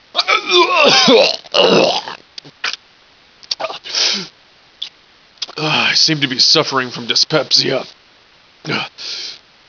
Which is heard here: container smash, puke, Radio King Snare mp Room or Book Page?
puke